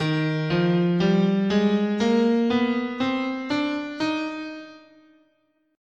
D sharp Bebop Major, scale

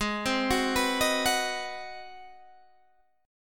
G sharp 7th Sharp 9th, Guitar, DADGAD; 18,15,16,16,18,16; down; chord shape

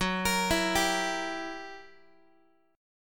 F sharp 7th Flat 9th Guitar, chord; x,14,13,14,11,x; 4A,4E,5A,5D,5Gsharp,6Csharp; arpeggiated

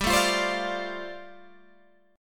G 7th Suspended 2nd Suspended 4th, Guitar Open G

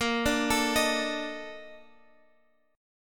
A sharp Major 11th A sharp Guitar Half Step Down; A#M11

